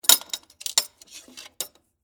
Pay Phone Coin, Technology, Telephone, Coin, Return, Open, Remove, Coin, Pay, Phone; Telephone Sound Effects; BlastWave